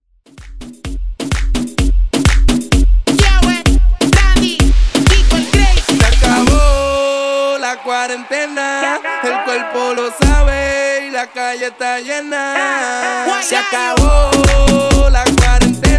Bootleg Dutch

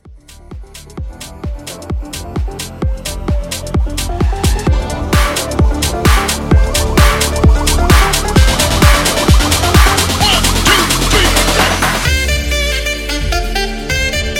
Bass House 130

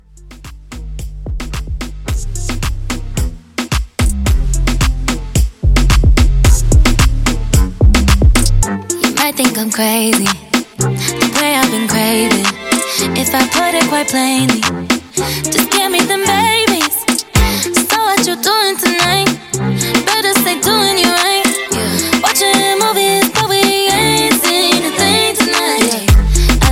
Moombah Re-Drum 110

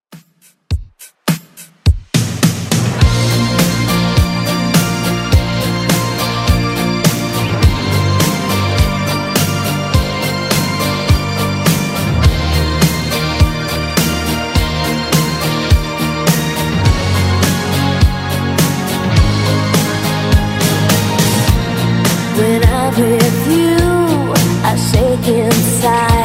80s redrum 104 bpm

